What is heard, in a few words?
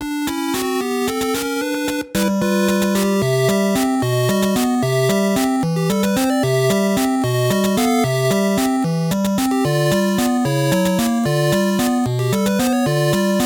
Underwater BGM GBC; mario bros. classic music collection; arcade family computer gb gba nes snes windows